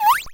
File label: click, sfx; asciinevrdie